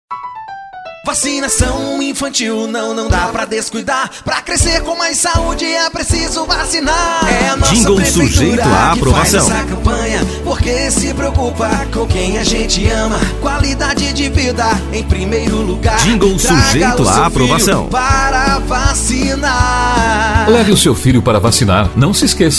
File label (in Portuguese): jingles politico vacinacao infantil